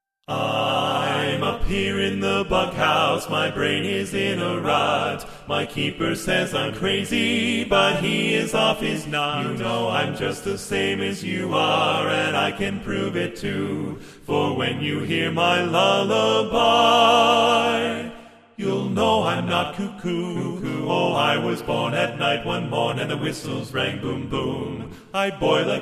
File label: Lullaby, vocal harmonies